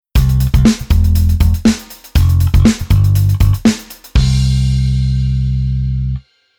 Bass Arr Rhythm Kyufu

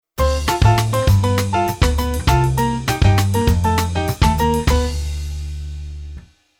Keyboard Pf Latin

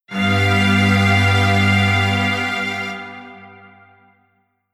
Strings

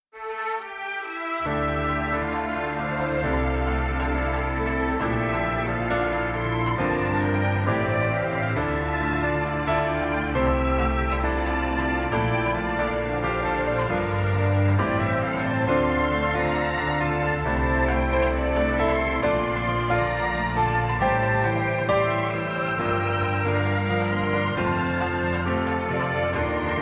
Strings Poly Sample Synth On

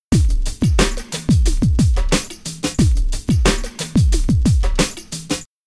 Rhythm HipHop

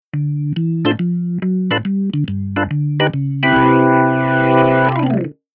Keyboard Organ

